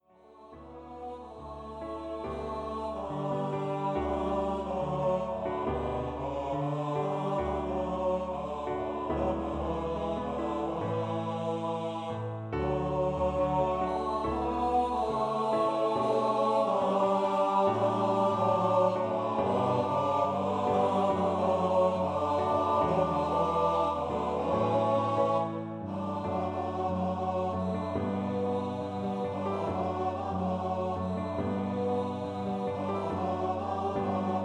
2 part song for Male Voice Choir

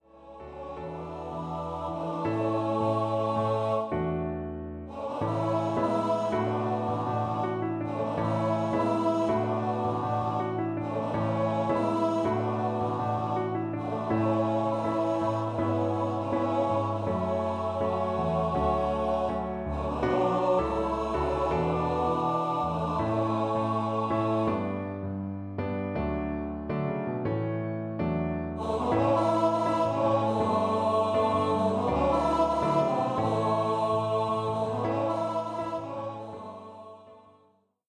4 part song for Male Voice Choir

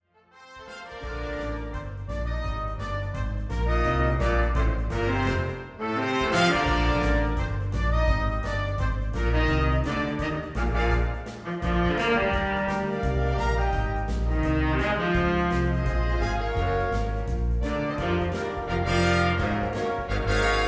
Big Band

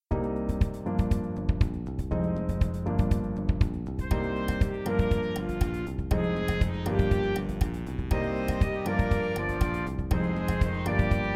BOSS THE FUNK for Jazz Ensemble

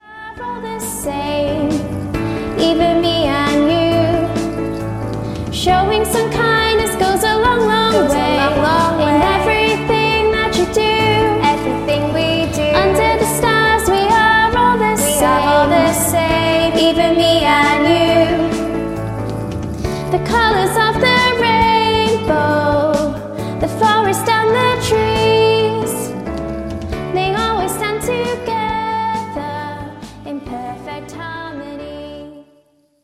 Live 2 part song

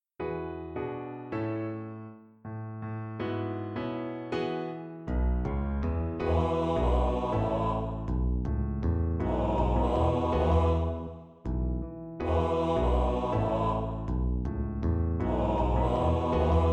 Male Voice Choir

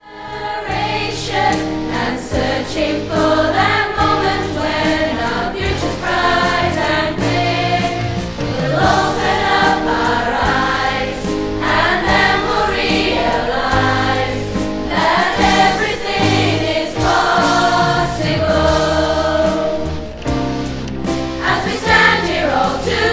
live version 2 part song for treble voices

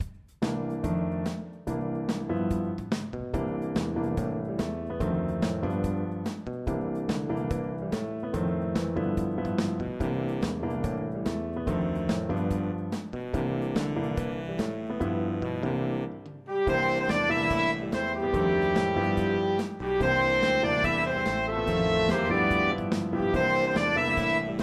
SWING TONE

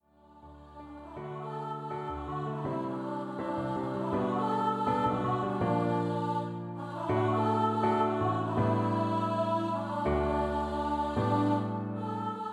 4 part song for Treble Voices

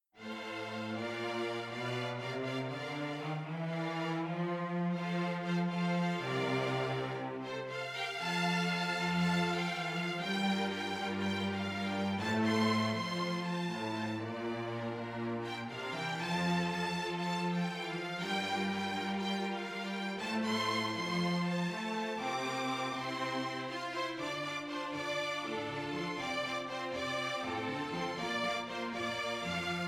for String Orchestra

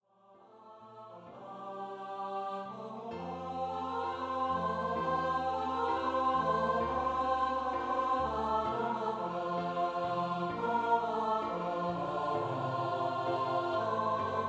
vocal duet for treble and tenor voices

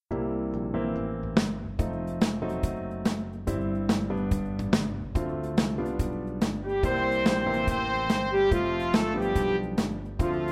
SIMPLY JAZZ

